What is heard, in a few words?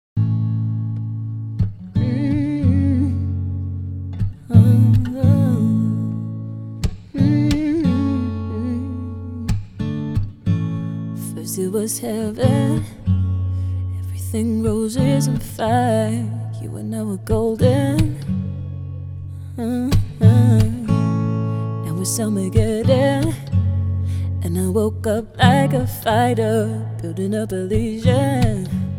thunder acoustic